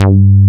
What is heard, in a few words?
JNO SPIK G3, SPIKE BS